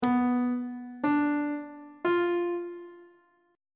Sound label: c flat diminished triad chord intervals on treble clef